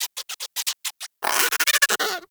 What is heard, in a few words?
Forest Rodent Vocal Captured Idle; Hunting Critters